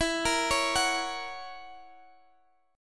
F sharp Major E; arpeggiated; F#/E

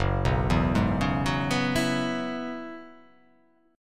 Gb 7th Suspended 2nd Suspended 4th Gb down; arpeggiated; JGuitar

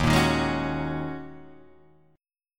D sharp Minor 11th D sharp Guitar Open G, chord

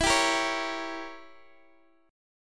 E Diminished 7th E, Guitar Open D

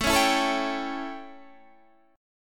A 7th, chord; strummed

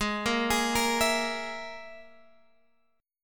Ab Suspended 2nd Sharp 5th Ab Guitar Open G

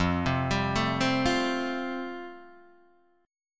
F Major Add 9th, Guitar Open D

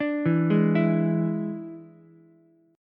E 7th E down Ukulele; arpeggiated; chord; JGuitar

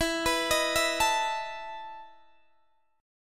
E Major 7th Suspended 4th E down Guitar Open D

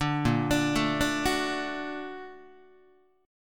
Bb Augmented Major 7th Bb, Guitar; Bb+M7 arpeggiated; JGuitar

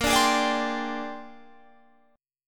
Bb 13th, Guitar, Standard; Bb13 strummed

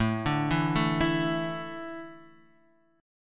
A Suspended 4th, Asus4 chord; arpeggiated; 5,5,2,2,5,x; JGuitar

